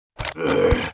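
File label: war damage, Mob; vaffermorter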